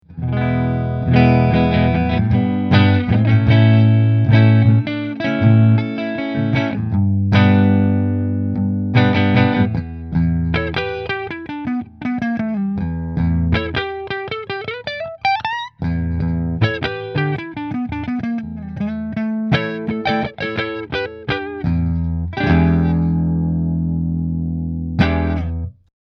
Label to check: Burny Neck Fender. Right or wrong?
Right